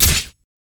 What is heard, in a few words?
sword